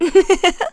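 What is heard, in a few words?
Vox Happy, Korean; Laudia; kingsraid; voices heroes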